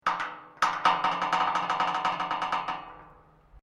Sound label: GATE METAL LARGE CREAK, Large metal gate; Gates (Sound Effects:Doors)